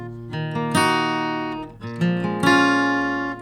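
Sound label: omni, Accoustic Guitar; RE-50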